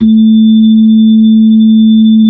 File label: B-3 Hollow; Xfade; Keyboards of The 60's and 70's